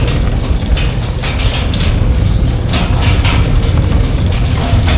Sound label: axle roll, train; Portal 2